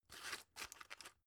drug put in; berufe; arzt

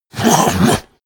monster gasp aggressive; kreaturen

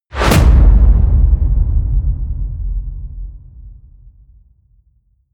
wham, whooshes

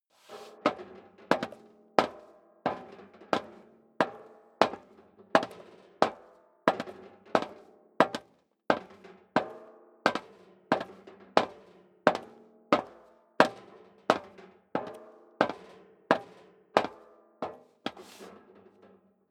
step leather shoes metal rattle walk sequence; schritte

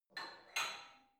barbell only replace reverberant; sport freizeit; fitnessstudio

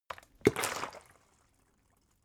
bottle water big upend hard; gastronomie; berufe

